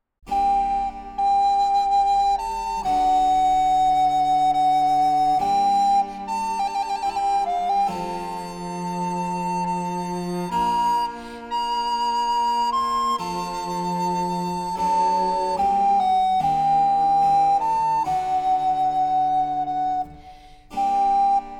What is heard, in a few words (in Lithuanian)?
fleitai ir klavesinui; A. Corelli; La Folia